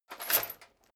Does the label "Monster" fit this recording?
No